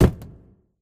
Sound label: phys cube, physics